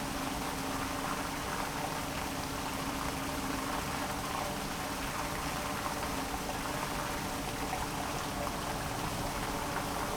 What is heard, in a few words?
Piping Systems Waterworks Tunnel Surface Water Flow Contact Mic; ShapingWaves